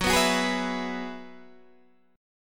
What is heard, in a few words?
Gb 7th Suspended 2nd Suspended 4th, Guitar, Open D